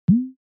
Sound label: folded Areas; accessibility signal